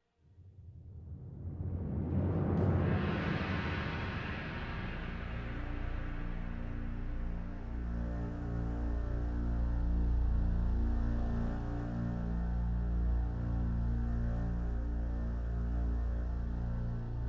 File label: Chant